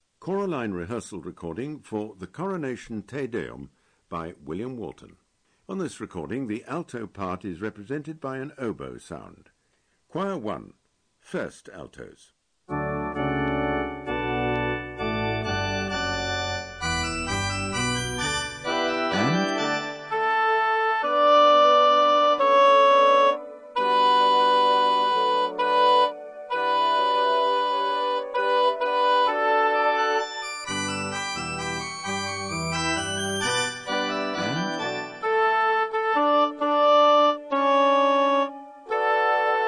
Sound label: Alto; Walton Coronation TD